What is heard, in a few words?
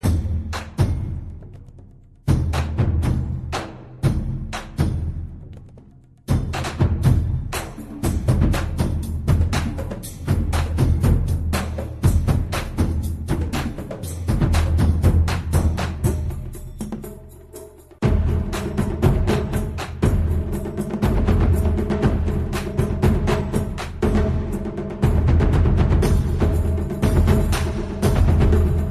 music battle preparation